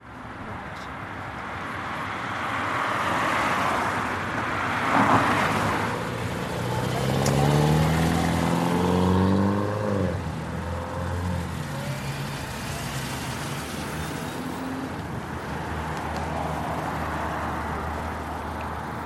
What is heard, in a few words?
car passing